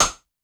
Rim Metal; Lost Tapes From Mars; Clap & Rim

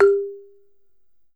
BALAFON, African Melodic

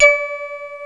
BELL, KORG, Analog; USB Soundscan vol.49; AKAI

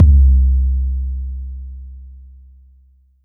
Jungle Kick; Roland SH-32; Drumset 03

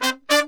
BRASS SALSA; Best Service ProSamples vol.14; AKAI

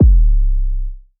C Bass, DnB One Shots

